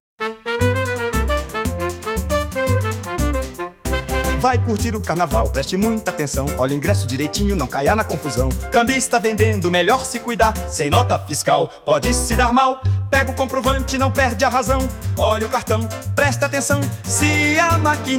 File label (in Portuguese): Samba; carnaval